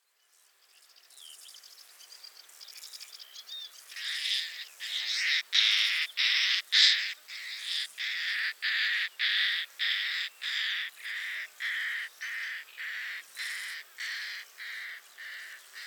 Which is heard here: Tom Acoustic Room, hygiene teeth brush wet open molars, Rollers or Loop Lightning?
Rollers